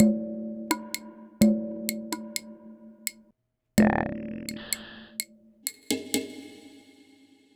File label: Electronic Percussion